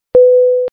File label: hang up